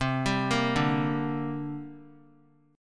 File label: C 7th Suspended 2nd C down; C7sus2 arpeggiated; JGuitar